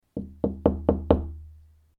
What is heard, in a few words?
Knock On Door, Moderately gentle knocking on wooden door; Knock Sound Effects; Lynne Music